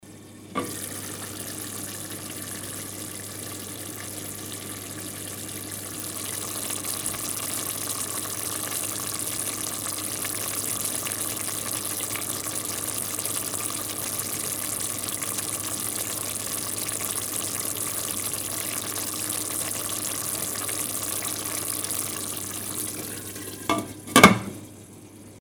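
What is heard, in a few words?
sound of frying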